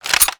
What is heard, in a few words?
sidewinder ammo; Daikatana